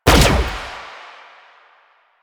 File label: Fire Shot; Duviri; Incarnon Adapters; Incarnon Sicarus Prime; Weapons